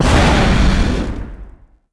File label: explo med sparks phased; impacts explos